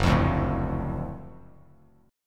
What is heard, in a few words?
F sharp Minor Major 7th Sharp 5th F sharp down Bass Standard; strummed